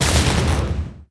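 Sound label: Hit long range electric shell; The Denpa Men; Android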